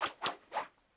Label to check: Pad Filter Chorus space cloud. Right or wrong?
Wrong